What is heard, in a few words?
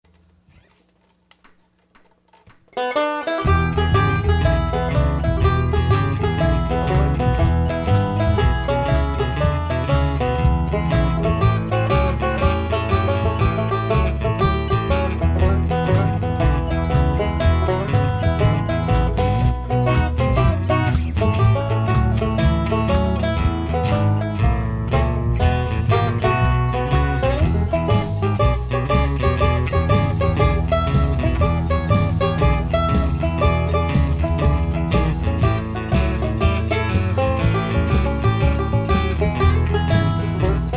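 12 bar blues, banjo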